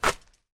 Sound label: Net Break; doodle jump 2; android